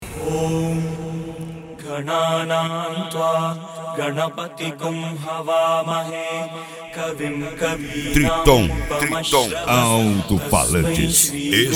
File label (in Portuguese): triton bass, Alto Falantes Especial Bass